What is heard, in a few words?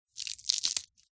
animals insect cockroach crush squash crunch